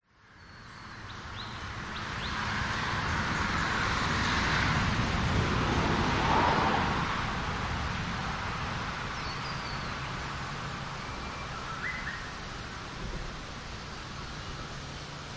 traffic passing rest area birds wind trees australia; vehicles